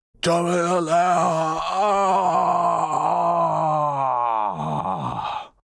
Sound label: Death; Markoth